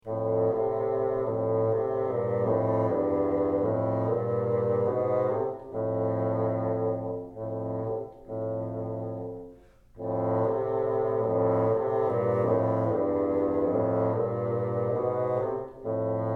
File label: together, bassoon